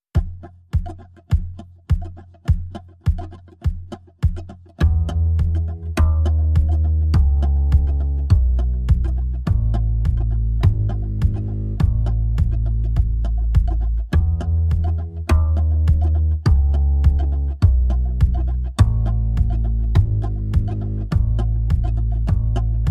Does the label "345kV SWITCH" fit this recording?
No